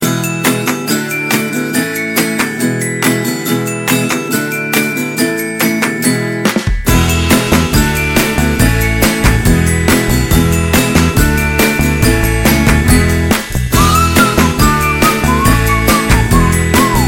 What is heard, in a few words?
Acoustic Melody